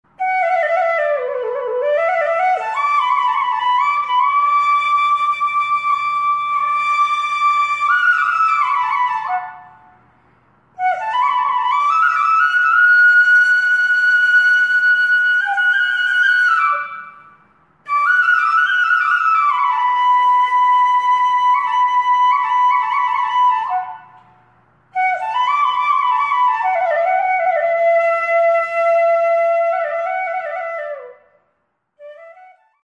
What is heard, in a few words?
Tamil God Ringtone